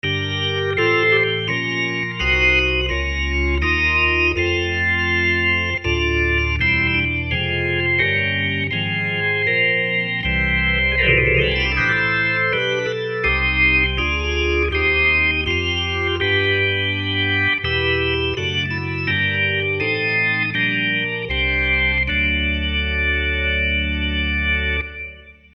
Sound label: Leslie Choral